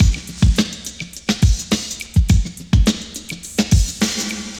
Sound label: LOP9 L, Drum Loops; Big Fish Audio; Prosonus 500 Drum Loops